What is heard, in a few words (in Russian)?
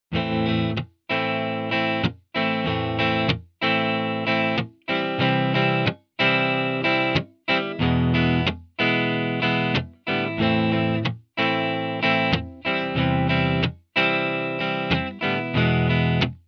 boya chetverka s glusheniem